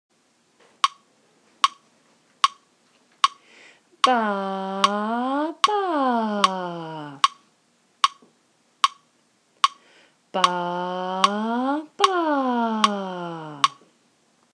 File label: circle rhythm